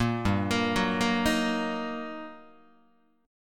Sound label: G Minor Major 7th Sharp 5th, G, down, Guitar, 4A 4E 5A 5D 5G sharp 6C sharp; GmM7#5 arpeggiated; JGuitar